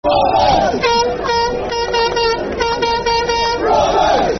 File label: Rovers (Clap), Football Chants (Soccer Songs); Blackburn Rovers Brfc